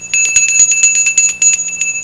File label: SM BELL; special effects